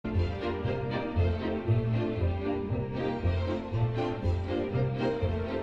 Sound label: Bass Cello Only